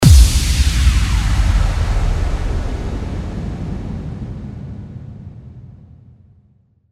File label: Downlifter Kick, 142 BPM